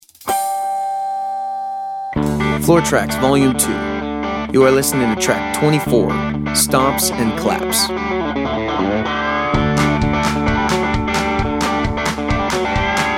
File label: Stomps and Claps